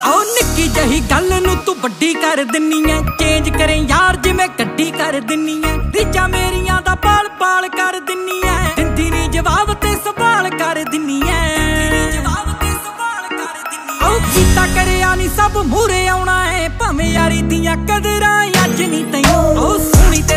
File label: Punjabi; punjabi song